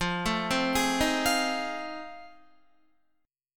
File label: F 7th Sharp 9th, Guitar; F7#9 arpeggiated